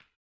HAMBONE, Vocal Blues Soul; Spectrasonics Vocal Planet

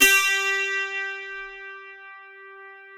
G4, DULCIMER; SoundBytes; Early Patches

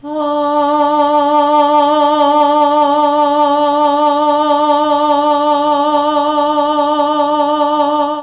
D2 FEMALE, Mellotron; CHMB FEMALE; Mike Pinder - Mellotron Archive CD

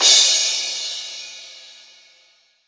crash cymbal, mono

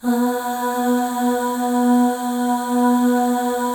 AH FLAIR, FEM; Spectrasonics; FEM.2 AHS

